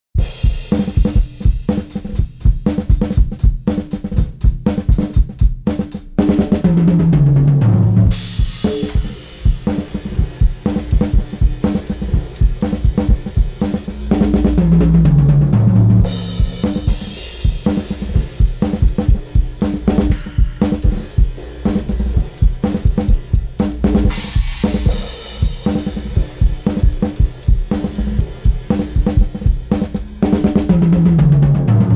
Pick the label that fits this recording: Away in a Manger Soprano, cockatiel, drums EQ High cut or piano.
drums EQ High cut